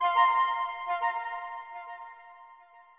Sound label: Musician Rebloom Flute